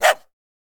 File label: dog barking